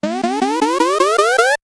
boss charge; zsdx

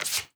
draw card from desk

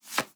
pick up card

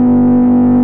engine; stunt rally

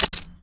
button; tanks of freedom

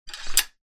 sword sheathed; project hawk

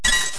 picked rupee; zsdx

